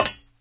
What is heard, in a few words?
metal step; Original TK Sounds